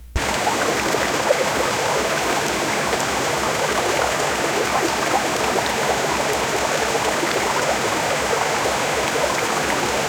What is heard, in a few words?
medium rapid speed river